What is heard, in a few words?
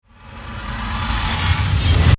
Whoosh Reverse, Suction, Accent, Transition; Production, Element, Imaging; BlastWave